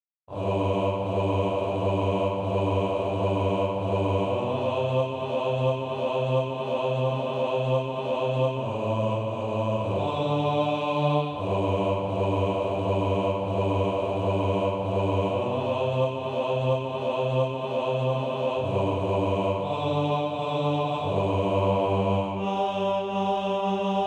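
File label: bass, Sheet music (SATB) including Practise Music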